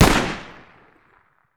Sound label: sako fire; sako92; garrysmod